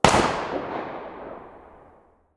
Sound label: galil muzzle mono far